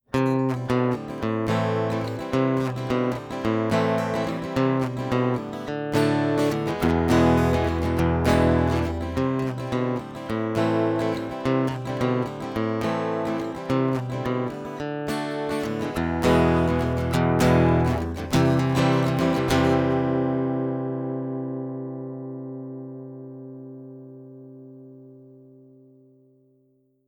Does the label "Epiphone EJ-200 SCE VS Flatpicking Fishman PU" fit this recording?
Yes